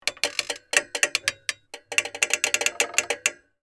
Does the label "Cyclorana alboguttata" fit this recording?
No